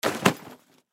Lacrosse Equipment; Lacrosse Equipment Bag Drop Cement; Sports

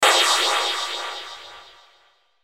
Hit Flange Light, Hit Flange Light Airy; Blastwave

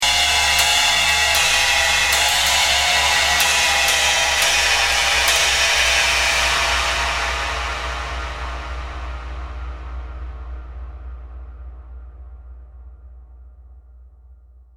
Metal Bashes, Rapid metallic bashes.; Bashing; Objects

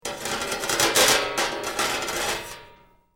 Metal Drag Scrap Clanking; Metal rattles from dragging a metal bar across metal ridges.; Clank Sound Effects

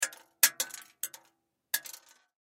Impact Debris Small Drop Metal Surface; Impact Debris Small Pieces Drop Metal Surface; Blastwave

